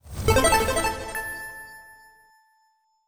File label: Checkpoint Activated